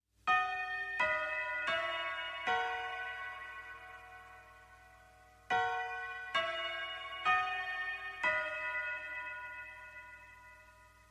theater pre show announcement chimes

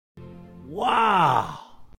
woah; ghost nihil

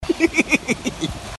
ehee ee ee e

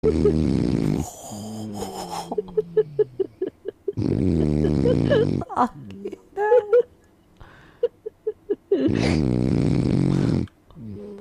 snoring

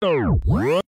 revese funk; tiktok

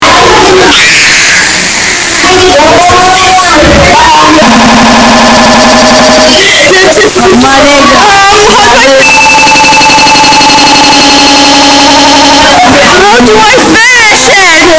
glitching humans

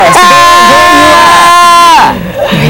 haaaaa

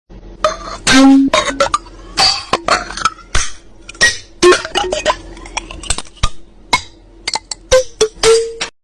classroom asmr

